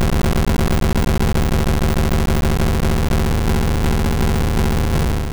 Fire Blast; attack moves; pokemon; gen 2; gsc

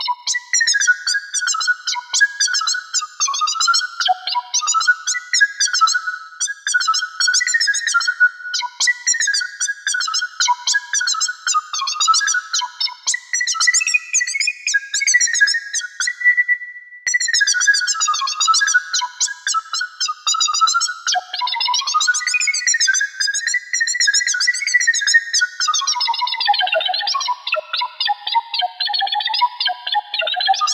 Birds' Song; Nintendo Labo; Toy-Con 04 VR Kit

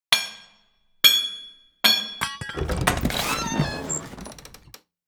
Chest Opens

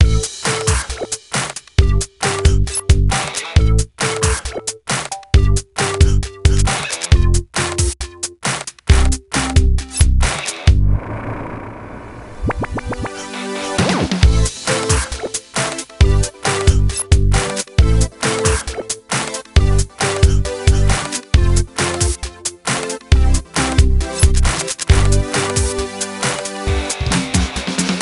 Track No Vocals; Science

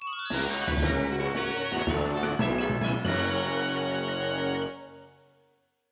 Mission Complete! Boss Battle Cleared!; Pikmin 3

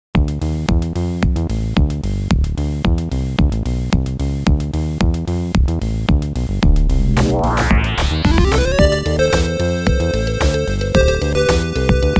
FM Instrument Version; POWER DoLLS

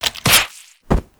Kill Alien, sound effects; Among Us

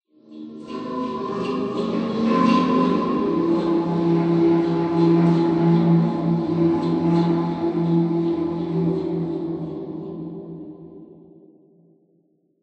Jazz

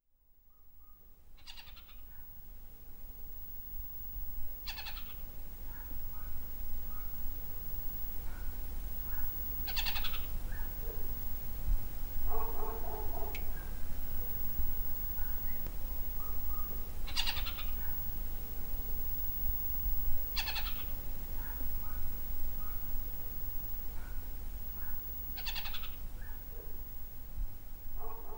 amb generic outside day; Lucius III

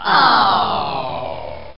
Aww; tempest 4000

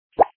sound buttonable; Monster Arena

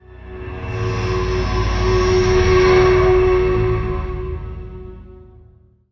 Camera Transition

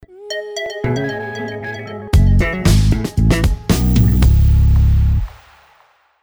Stinger; Learn to Fly 3; Flash Penguin

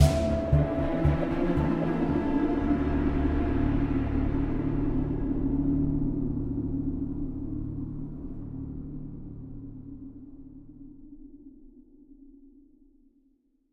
String; Assassin's Creed Unity Dead Kings

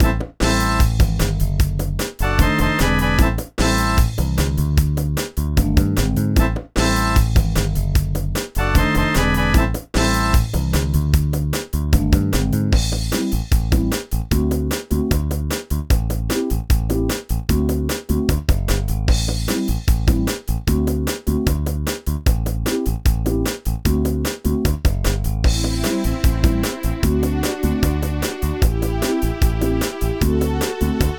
Battle; Kirihara Shoten Forest Eigo at DS; NDS

